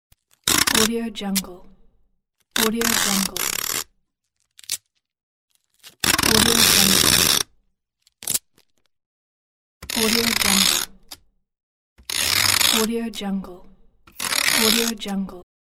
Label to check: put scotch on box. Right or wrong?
Right